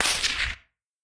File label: whiz super; midnight guns